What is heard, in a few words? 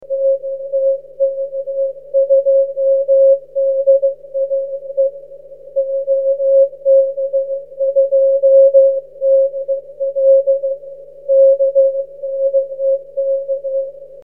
big CW